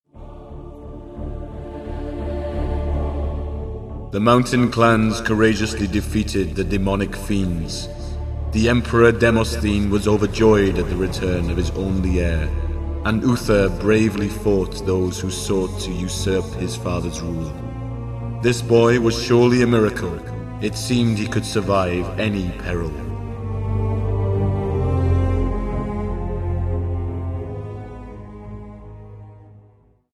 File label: Mountain Clan Win; Disciples II Dark Prophecy Gold Edition